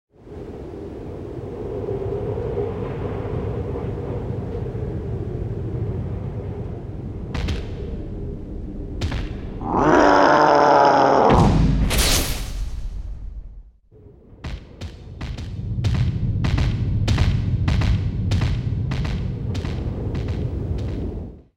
Appear

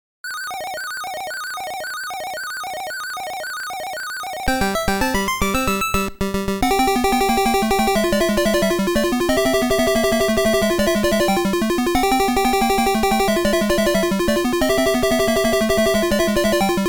Escape Stage Clear; Dig Dug; Game Sound Museum Namcot

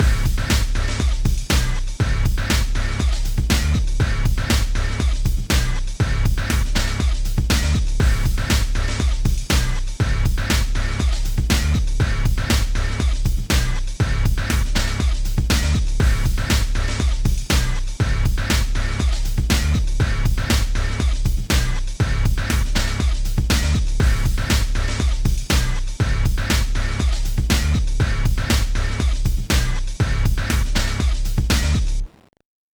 Prison 120bpm

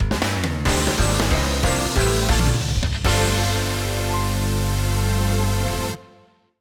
Jingle Battle; goonya monster

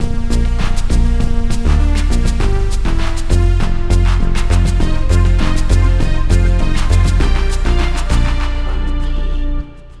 ITALO-DISCO; timmy turners globe run 2023

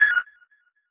Beep OK

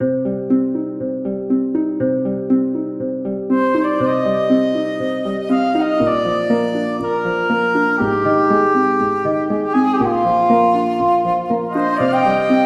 Exploration Forest Of Magic, RPG Fantasy Music; Sofiya and the Ancient Clan; PS4, PS5, Switch; RPG Fantasy Music Pack

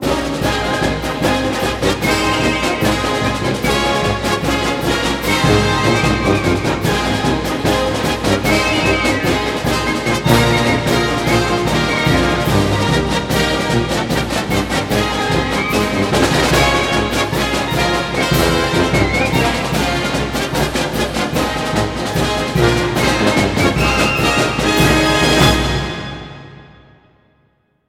Fight Song

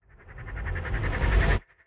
Bumper; Tom Clancy's Splinter Cell: Conviction; Android

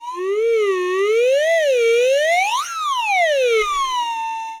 Glass Music, glass loop clown; Ringling Bros. and Barnum & Bailey; Wii

